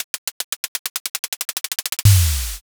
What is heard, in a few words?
drumroll